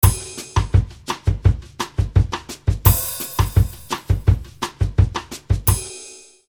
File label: bicie loop samba; Mirex